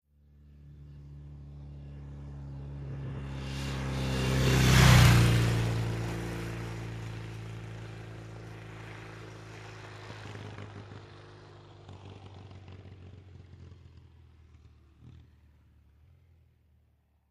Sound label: Motorcycle, Norton, Accelerates In And Fast By ( 45 Mph ).